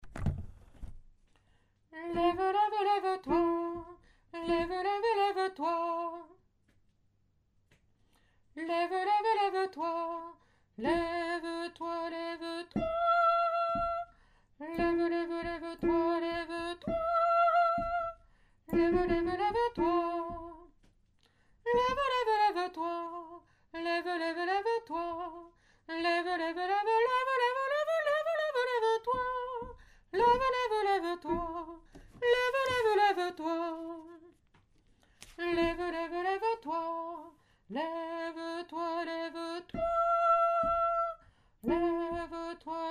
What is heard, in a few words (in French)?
le veto i soprano, choeur femmes